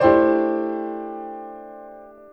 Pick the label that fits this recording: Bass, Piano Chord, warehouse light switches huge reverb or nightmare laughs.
Piano Chord